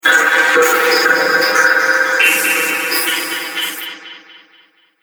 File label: Dark Horror Hits; impact; Miscellaneous Impact Sound Effects; Impact / Explosion Sound Effect; Andrew Fleming